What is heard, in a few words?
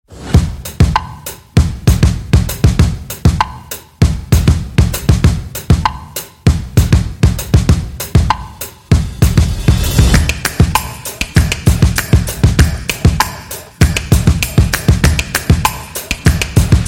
Chillout Soft Drums; calm drums